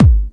909 Phat, Kick Drums; Zero-G; Soundclash

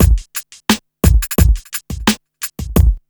RNB 87 BEAT

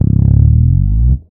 slides, bass; Spectrasonics; Bass Legends CD 1

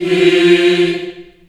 F3, Choir

